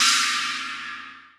china, virtual drum sounds

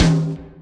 tom, drums; virtual drum sounds; custom drums

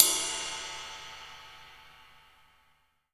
ride, virtual drum sounds

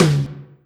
tom, drums; virtual drum sounds

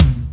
tom; virtual drum machine

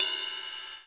bell, virtual drum sounds

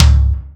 tom, drums; virtual drum sounds; custom drums

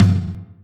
tom 4; drums; virtual drum sounds

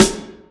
snare, drums; virtual drum sounds; custom drums

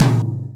tom 4; virtual drum sounds

